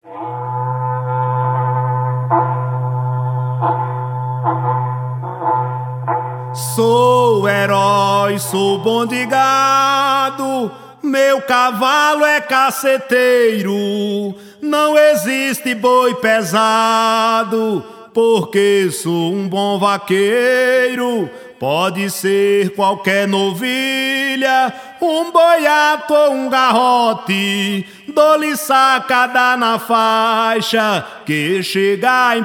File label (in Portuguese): aboio e banda; promocional